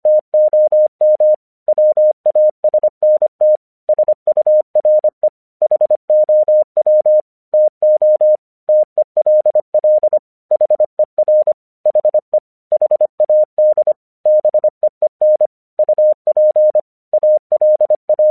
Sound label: English 25wpm